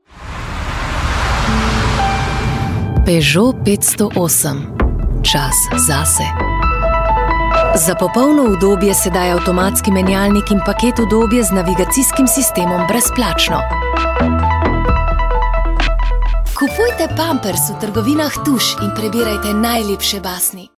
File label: Mix Vox